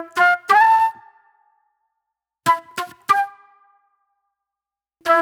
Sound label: J-Funk Flute, Call And Response